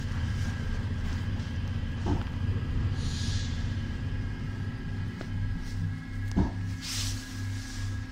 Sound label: ambiance, Metro